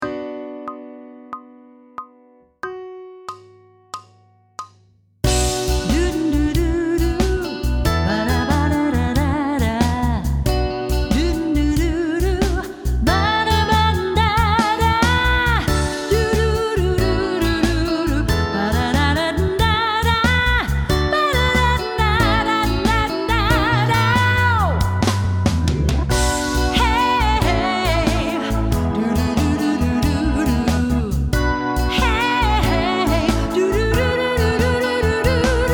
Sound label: Sight Singing with vocal, pop vocals; Grade 7